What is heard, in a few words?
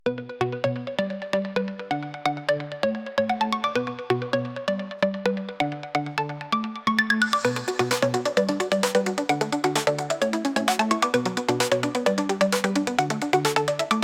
TechnoBIT